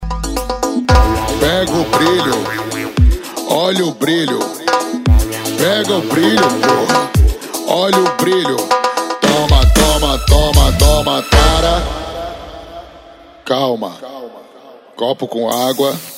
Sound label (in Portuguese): piseiro